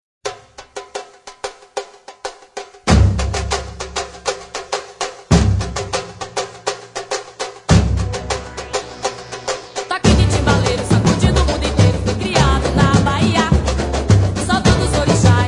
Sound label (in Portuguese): Toque De Timbaleiro, Samba, Timbalada